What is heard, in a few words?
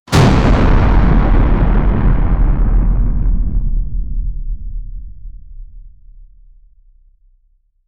a huge monster emerges, lava; a huge monster emerges fr